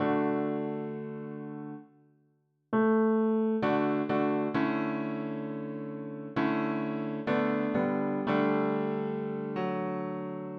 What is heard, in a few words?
Piano